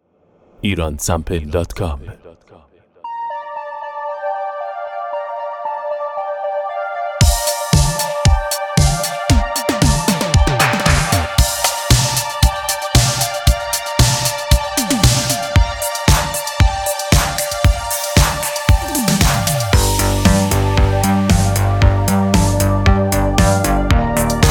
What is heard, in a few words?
80s drum samples